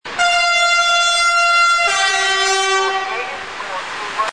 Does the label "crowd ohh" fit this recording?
No